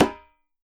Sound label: MIXED DRUMS; Best Service ProSamples vol.52 - World Instruments 2; AKAI